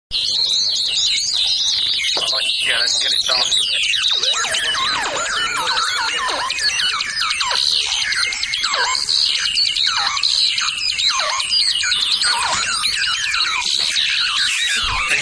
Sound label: theremin noises